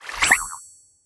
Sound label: flower blooms; activity center; backyard; preschool time